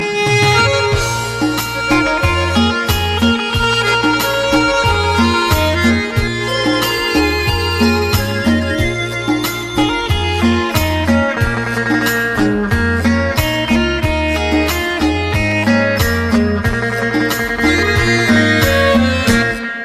thai ringtone, dance

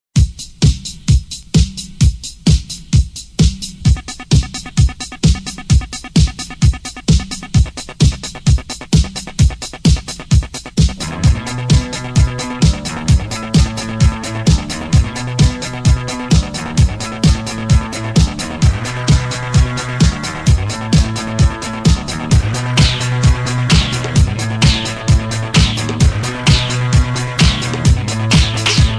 Thumping Beat Tone

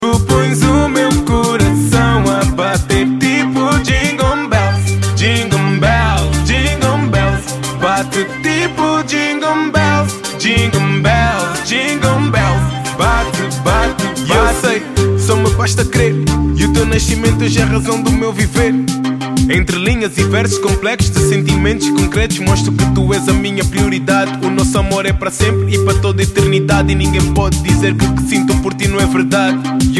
Jingle Bells

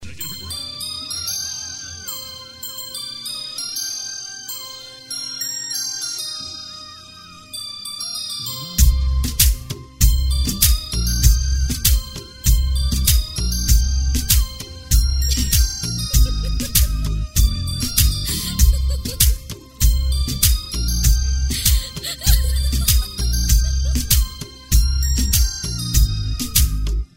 super love tone ever, ringtones

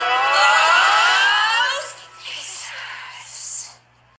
Cheer; GhostFacers

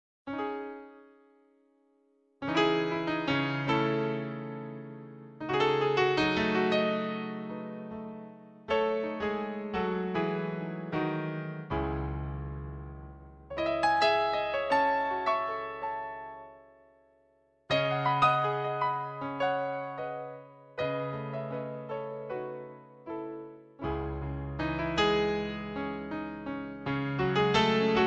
sweet piano

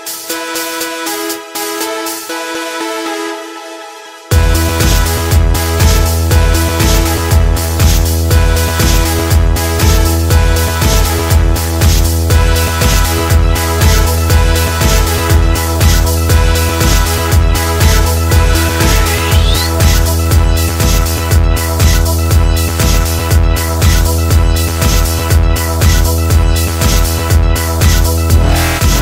Technopop

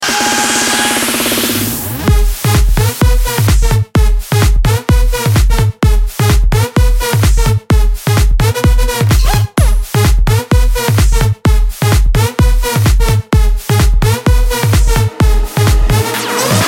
electro house mix

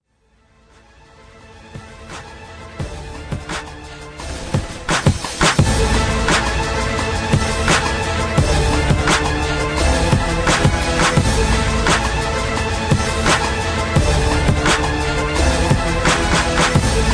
aggressive violin rap beat

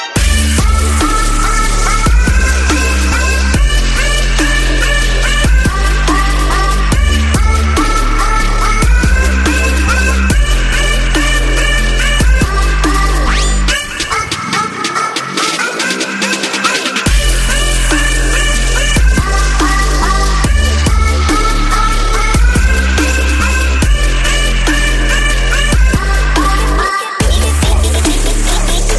Trap

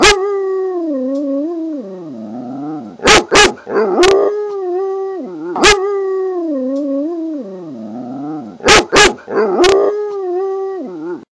Howl; Kaji

